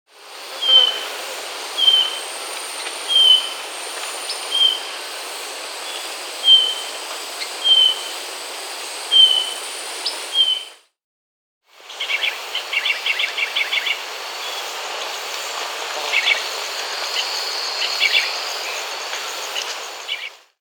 Blue-Naped Chlorophonia (Chlorophonia Cyanea), Andean Birds; S. America